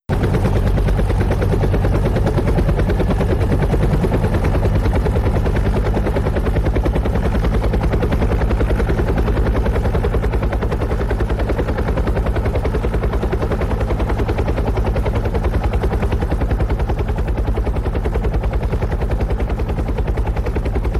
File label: use gyrocopter; KevinBlast; marble